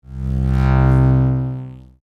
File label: Ray Phaser Flange, Scifi; Short, Science, Fiction; Battle Sound Effects; BlastWave